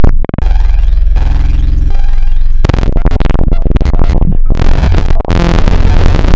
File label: vgame; Adventure Kid